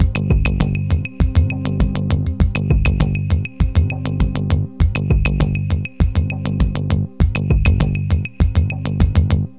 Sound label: Elektro, Processed Percussion